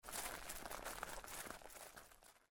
Footsteps; Microsoft Studios; Age of Empires III - Complete Collection; cinematics

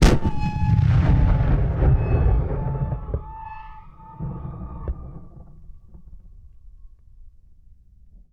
Cannon Blast With Metal Sway, Period Combat; Sony Pictures Sound Effects Series

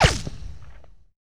Rico & Whiz By Single; Sony Pictures Sound Effects Series; Ricochets - Hits - Whiz Bys

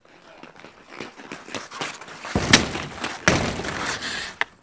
Hockey Skate And Board Hit; Sony Pictures Sound Effects Series

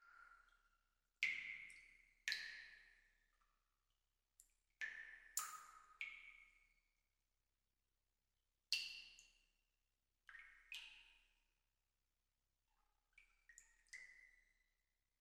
Cave; Age of Empires III; cinematics

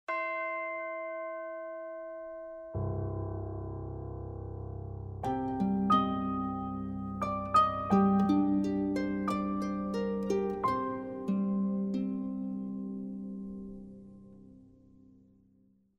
Music; Age of Empires III; cinematics

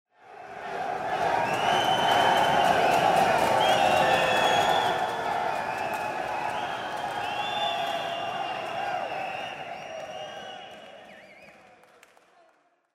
cheer; Age of Empires III; cinematics

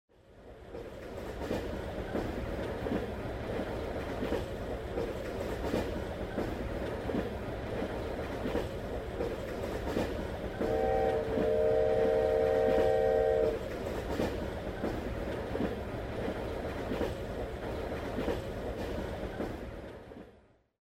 Train Far; Age of Empires III; cinematics

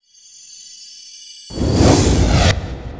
Regen; RYL 2

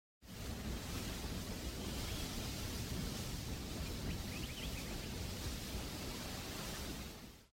Fountain; Age of Empires III; cinematics

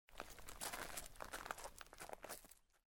Steps; Microsoft Studios; Age of Empires III - Complete Collection; cinematics

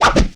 Kung Fu Old School Whoosh, Hand To Hand Combat; Sony Pictures Sound Effects Series

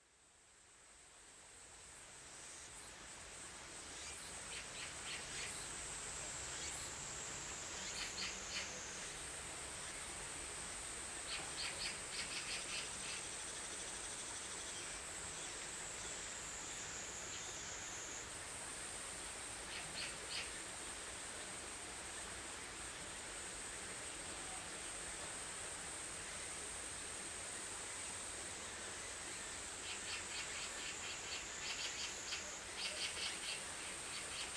Ambient; Microsoft Studios; Age of Empires III - Complete Collection; cinematics

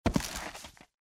Deer Fall; Age of Empires III; cinematics

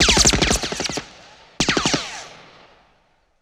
Sub-Machine Gun Ricos Multiple; Sony Pictures Sound Effects Series; Ricochets - Hits - Whiz Bys

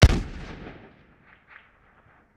Musket Single Shot Distant; Period Combat; Sony Pictures Sound Effects Series

